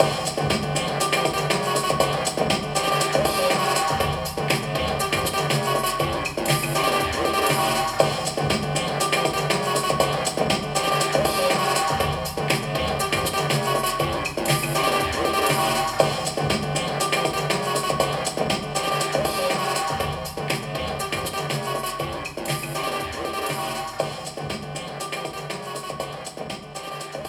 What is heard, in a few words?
Electro; lego star wars the skywalker saga; ps4; xbox series xs; Uscru District